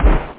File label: car bump